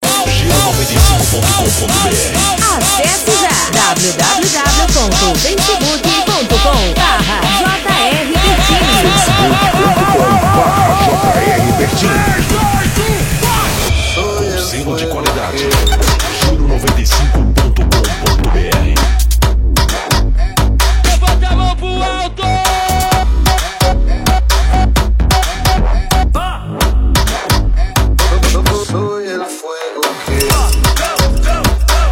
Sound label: Funk Production Remix